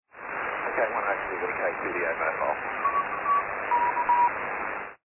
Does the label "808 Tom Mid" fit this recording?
No